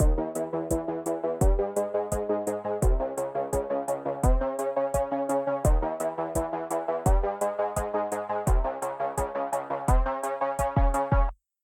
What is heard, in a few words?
Quasar Middle; Mixolumia